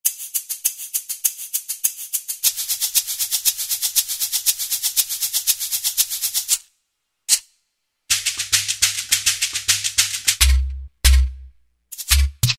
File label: Shaker; Roland SR-JV80-05 World